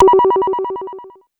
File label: Pollard Syndrum